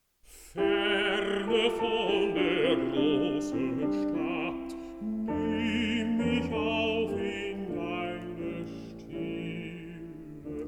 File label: song for voice & piano